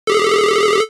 Ringer Bonjour, BlackBerry; OS-4.6

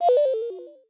Active Sync Fail, Samsung SGH-i300

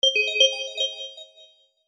Small Bell, notifications; Tecno Spark 40C

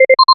auto Answer; Apple iOS 26

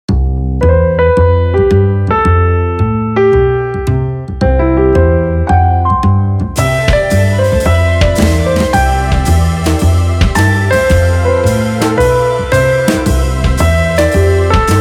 jazz, ringtones; i-mobile i-style 711

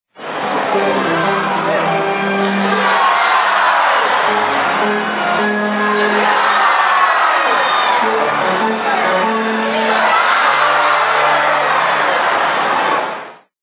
Sports Charge, Windows Mobile ringtones; Microsoft

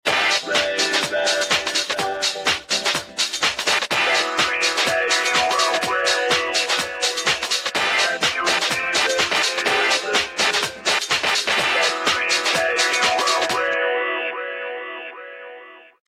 Techno Dance, Ringtones, Club Dance

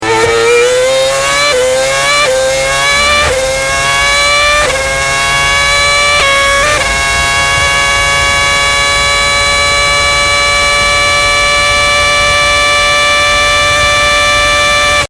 Acceleration; Red Bull MOBILE

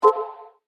CHAT IN, notifications; Sonim XP8 ATT

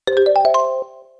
SMS

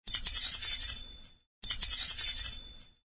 Wind tone; Samsung SGH-E251 India

